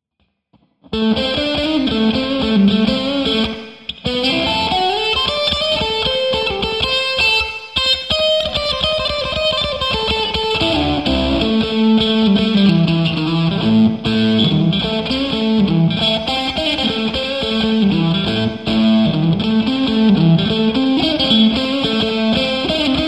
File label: JCM800 OD; P90